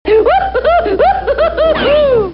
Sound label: wohoohoo